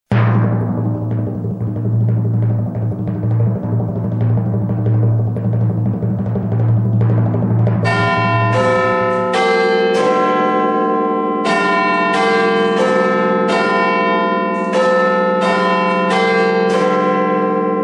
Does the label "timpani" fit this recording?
No